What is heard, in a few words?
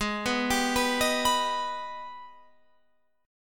Ab Minor Major 7th, down, Guitar, Open F; arpeggiated; AbmM7